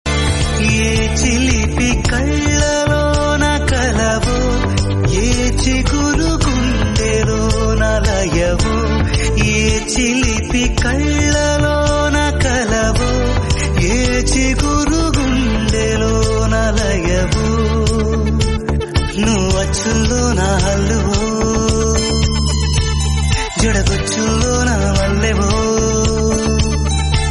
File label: telugu song; bommarillu